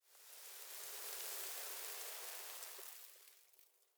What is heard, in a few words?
sand gust; dust2